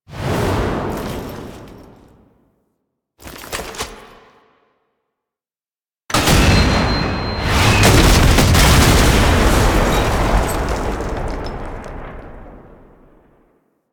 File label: Death Cinematic SFX; Quincy; Warframe; NineteenNinetyNine; Stage12PartyOfALifetime